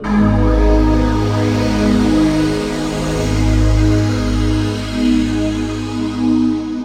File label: Pad Oceanic, Drum & Bass Pads; Sonic Foundry (Sony Creative Software); Knutrix Drum & Bass